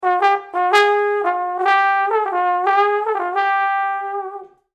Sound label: Trombone